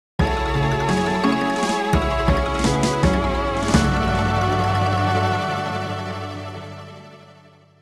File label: gameover, midi